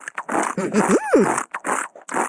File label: Industrial Chewing; cut the rope time travel; android